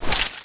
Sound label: clip in; tfa cso; x-7